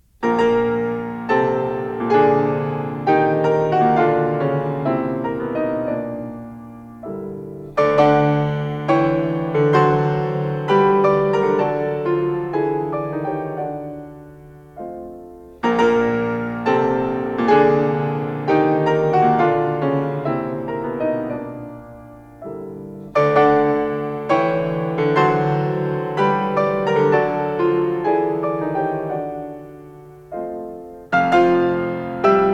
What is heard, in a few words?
Variations and Fugue on a Theme of Handel, for piano, in B flat major; Brahms